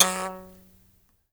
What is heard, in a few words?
HIBZ KL F#3, HIBZ KALI; Heart of Africa